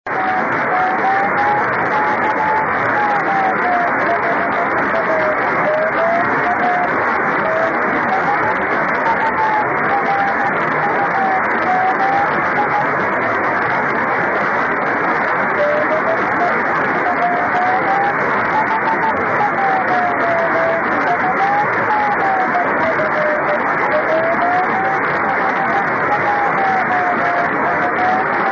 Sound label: 24G beacon; 曇、気温２０℃ 湿度７０％; JH1UGF→ＪＲ川崎駅ビル反射→回折→JN1AYV; JH1UGF 横浜市; JN1AYV 茅ヶ崎市